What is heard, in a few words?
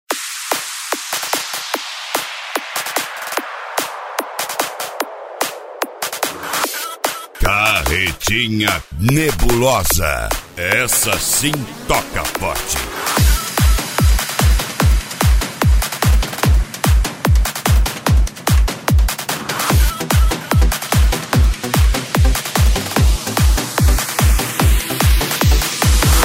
psy trance; cd carretinha nebulosa vol 02